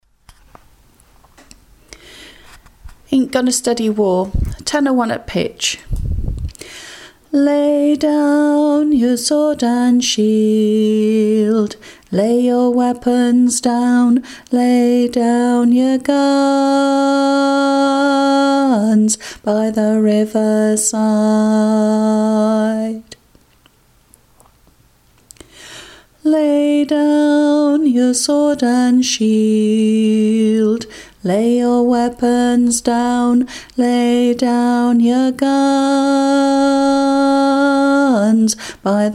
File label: tenor female; Aint Gonna Study War